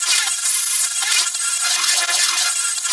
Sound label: INTERFERENCE; Spectrasonic Distorted Reality 1